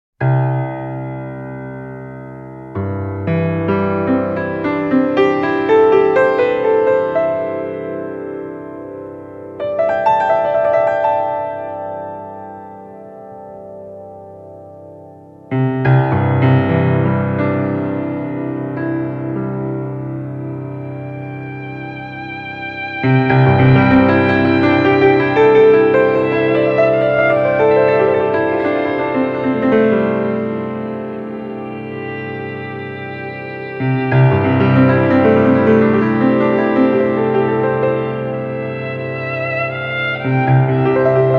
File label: music yoga